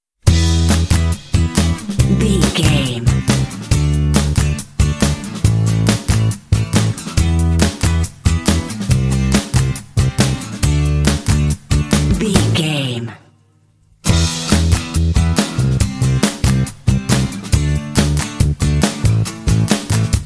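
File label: indie trojans rule no electric guitars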